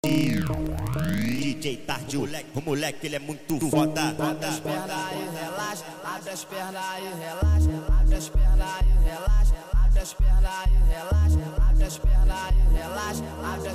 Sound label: Phonk Montagem